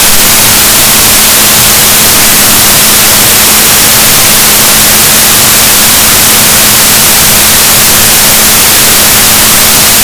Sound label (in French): rorquals negatif, Balaenoptera Physalus; gaussian noise; SNR -6dB